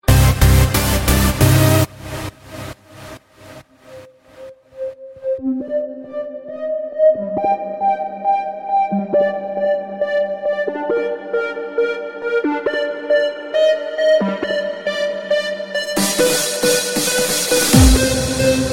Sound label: Trance Surround